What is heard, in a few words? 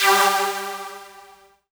Synth Hit G